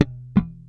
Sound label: SLAP